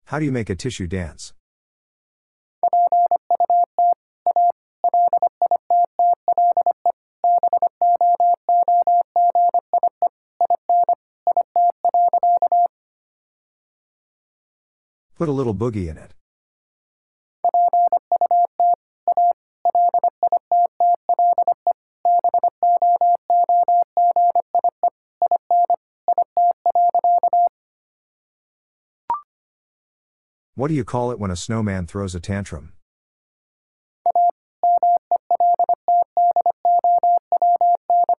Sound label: 25wpm, morse code practice; All-Age Appropriate Jokes